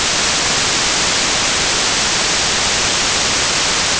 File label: white noise white noise